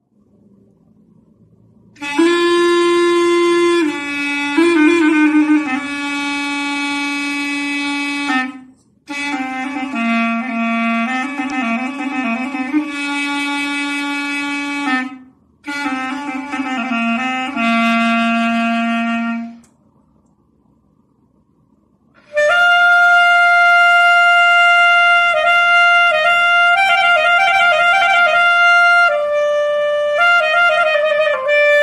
Clarinet; ساز قره نی

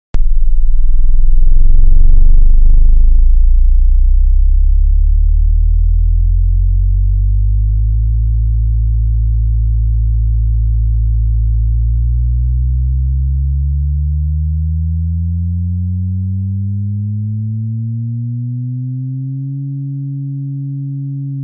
booster; ساب بوستر

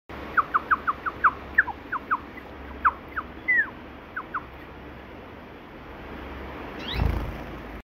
Java sparrow

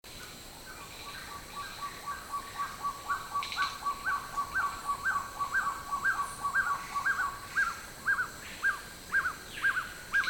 Guianan trogon

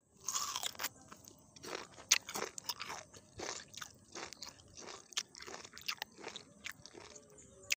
Chewing fruit

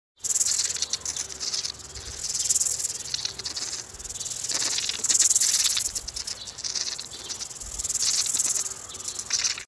Bengal monitor

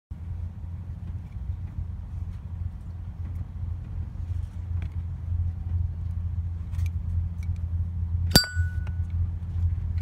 zippo lighter; فندک زیپو

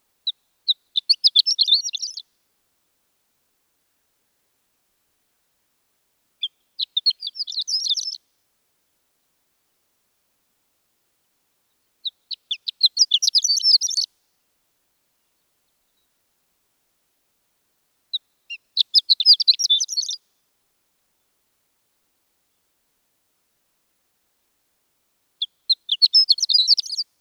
Horned Lark Sound

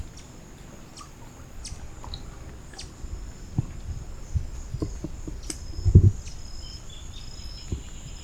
Green throated Mango, پرنده

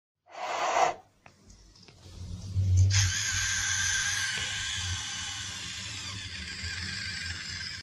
Salamanders; سمندر

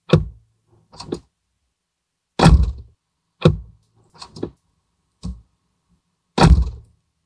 Car trunk; باز شدن; صندوق عقب ماشین

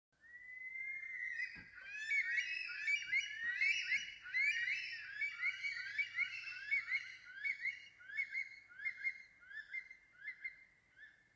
Bush stone curlew

